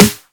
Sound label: natural acoustic snare sample g sharp key; snare drum samples